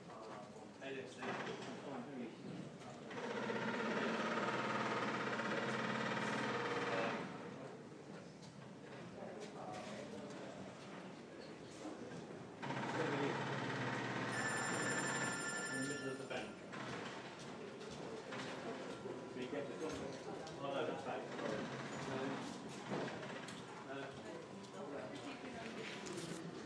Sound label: the noises near my office